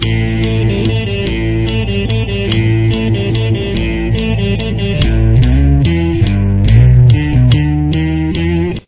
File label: guitar